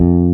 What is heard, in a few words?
bass F2